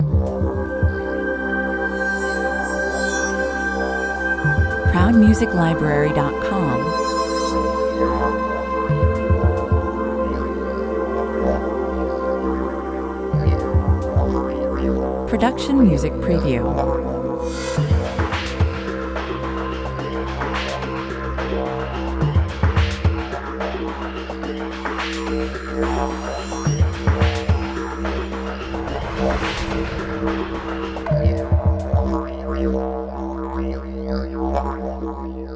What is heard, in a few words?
corporate kit (content loop) 108 bpm